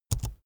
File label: keyboard laptop enter press fast soft